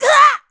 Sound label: Knock Down; Link getting knocked down; Zelda